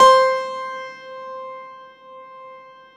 piano C3, Classical Piano ff +ped; Best Service ProSamples vol.53 - Classical Prepared Pianos; Total Piano; Brilliand Classical Piano

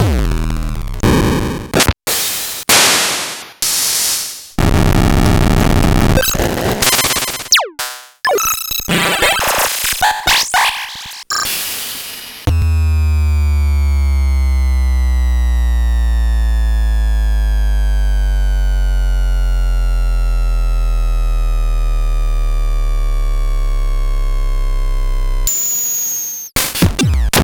8 Bits; Retrowave

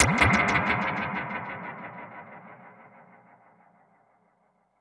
Perc Hit, dub percussion

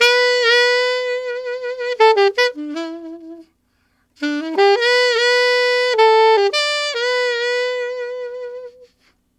Alto Swing G maj, Sax; Sonic Foundry (Sony Creative Software)

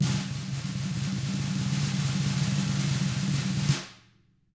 TAMA BR RL, Drums; ILIO; Double Platinum Drums 1

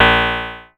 A#, PIANO; Big Fish Audio; Synclav FM

